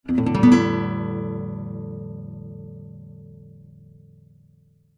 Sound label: guitar nylon out of tune single strum